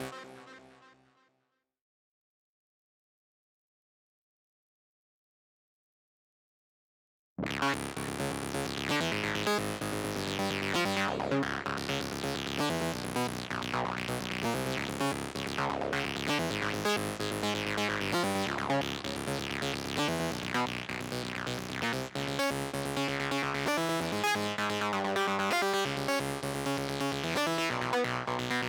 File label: Doom Mix Stem Layer; Asteroids